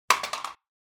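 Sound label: falling sound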